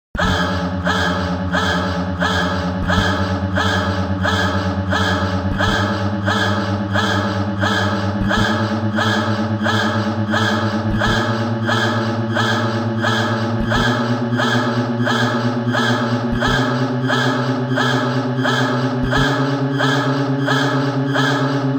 bgm; sitappagames; cookie

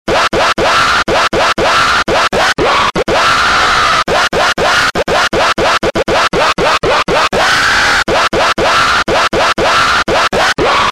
jingle scream

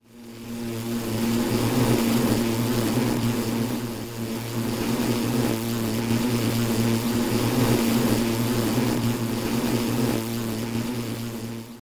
fly

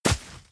snowball

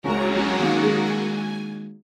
Fire Start; Kung Pow

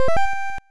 note fx; seagaia; ld22

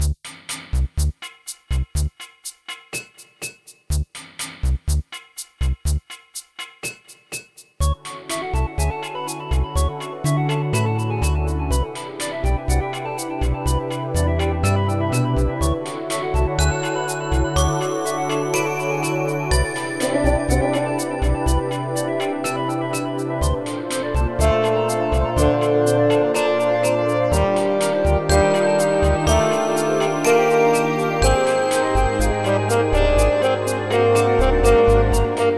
cargoship bgm; cross-code

